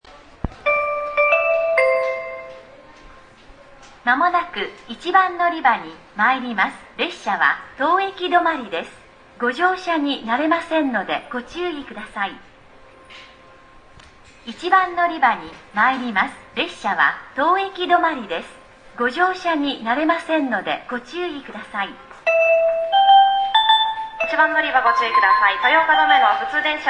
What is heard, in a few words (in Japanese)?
toyooka sekkin, ekihousou; sanin-line